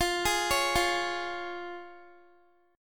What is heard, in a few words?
F Minor Sharp 5th, down, Guitar; Fm#5; arpeggiated; 4E,4A,5D,5G,5C,6F